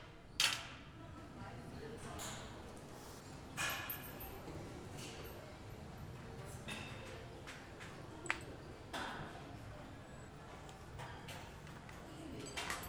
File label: gym, ambiance